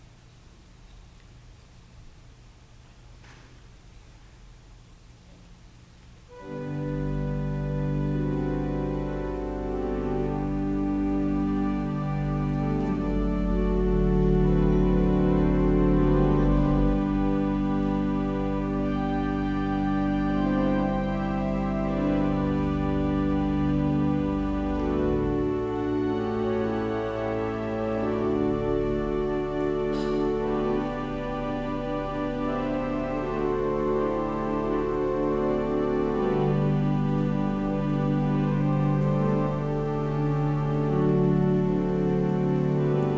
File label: Organ Music; isaiah 25 9